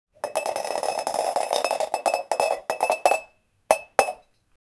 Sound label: take cup from box; take cup from box game